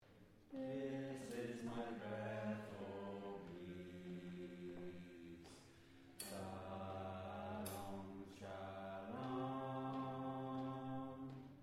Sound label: Bass